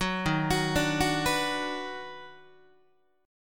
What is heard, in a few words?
D sharp Minor Major 7th Sharp 5th D sharp down Guitar; D#mM7#5 arpeggiated; 9,11,9,12,10,10; 4A,4E,5A,5D,5G#,6C#